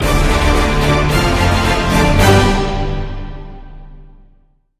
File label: Battle Win; Galaxy Legend (iOS) (gamerip) (2017)